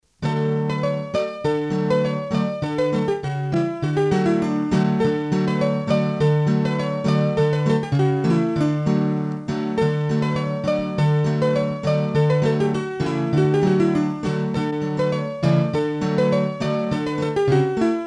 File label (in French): Bourree, hurdy gurdy; Bourrees a 2 Temp